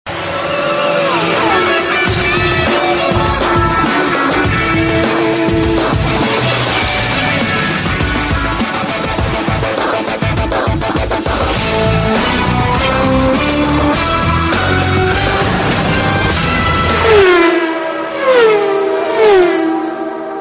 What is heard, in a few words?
Bike; Top Gear